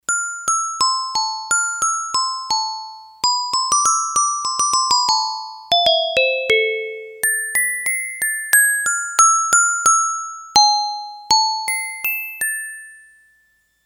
Yamaha DX11 Glocken; Factory Preset Voices

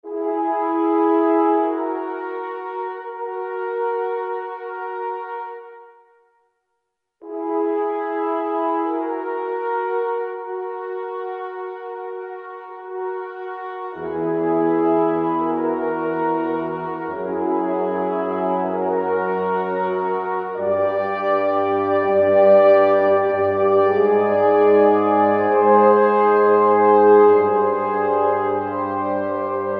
F. Hrn Sect, Roland SR-JV80-02 Orchestral

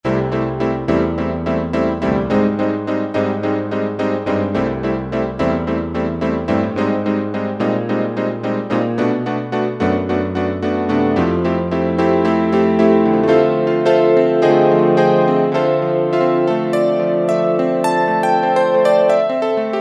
E. Grand mod

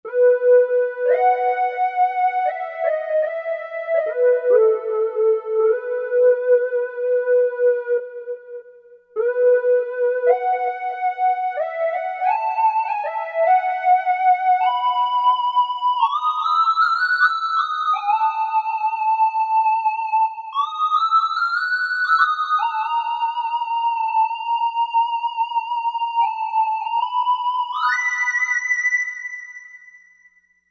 SineUsoid, Korg TR-Rack

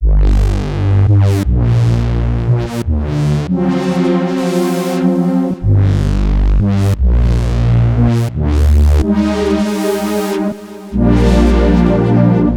Whacker Bass, Korg Radias-R

